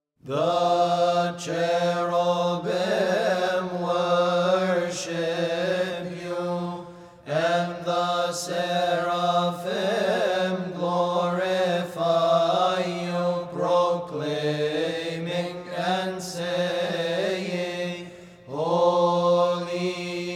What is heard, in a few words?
Cherubic Hymn